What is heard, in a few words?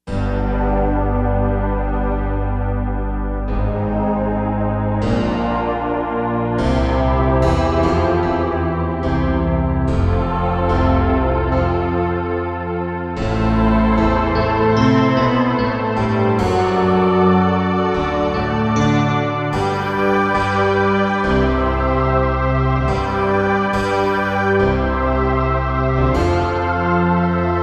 Oratorio, Korg MSC-2S Synth combinations; Synth 1